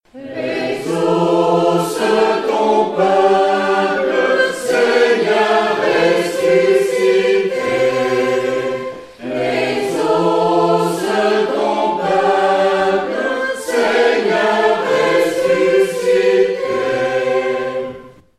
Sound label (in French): Tutti; Exauce ton peuple